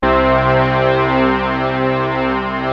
ARP STR C2; USB Soundscan vol.49 - Analog Fantasies; AKAI

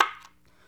SHENAI

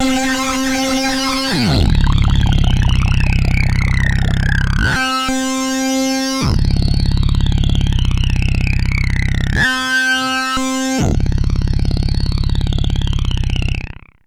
Yamaha CS1x filtered riffs; FM 57 - The Ultimate Sample Collection (1997)

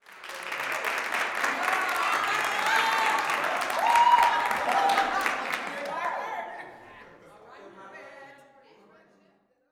SMALL APPL, gospel, church; Spectrasonics; Vocal Planet

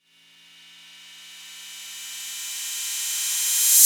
Reverse Cym, Cymbals

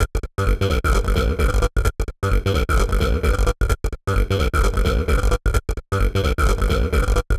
Seq 303 Loop 130; Equipped Music; Loungin House

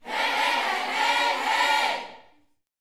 Vocal, Gospel, PHRASE; Spectrasonics

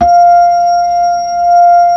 B-3, Hollow; Keyboards of The 60's and 70's

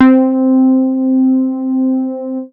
MOOG BASS C4; Best Service Dream Experience

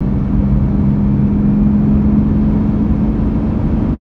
HIGHWAY; Transportation; VSI Car

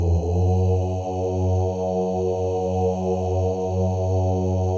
male ohs; Spectrasonics; Symphony of Voices

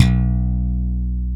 B 0 SLAP, SLAP BASS; AKAI S-Series CD-ROM Sound Library VOL-7; SLAP BASS 1

